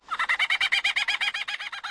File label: rock birds; ambient; World of Tanks Blitz